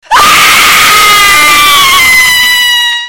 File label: scary woman scream